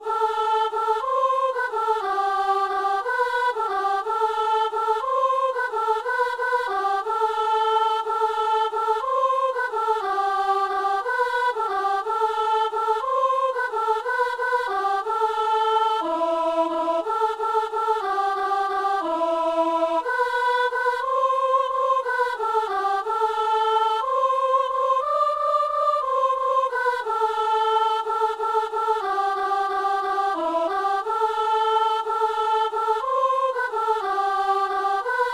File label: soprano; Alleluia - Psaume 348; Messe du 17 janvier à Saint-Denis